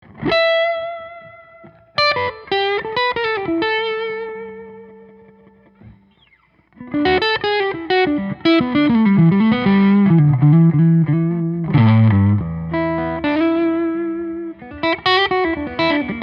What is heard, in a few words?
guitars; Matsuda Deconstruction; steel string guitars